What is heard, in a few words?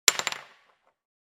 White chalk stick falling